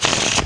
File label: Riffle, poker; iPoker 2000